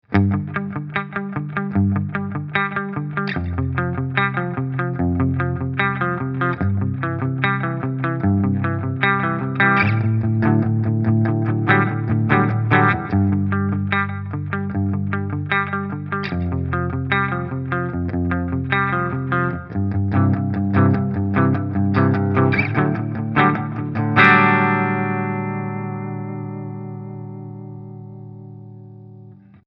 Maybach JazPole 63 Clean Steg

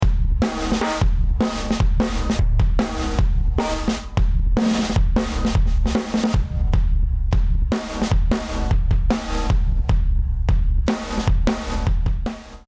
Drumbeat ohne Becken, schnelle Attack, mittlere Release, bis 20dB GR; VC-76

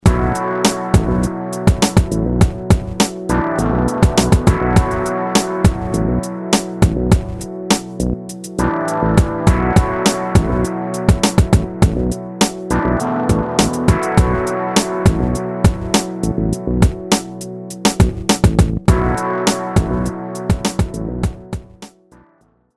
Track mit Effekten

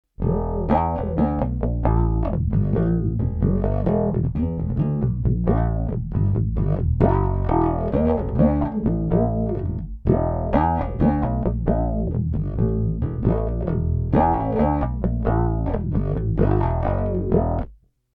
Rock Plektrum

